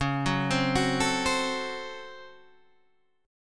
Db Major 7th Suspended 4th Sharp 5th Db Guitar Open G